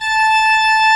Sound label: A 5, CHURCH OR; Best Service; Advanced Media Trax Modern Composer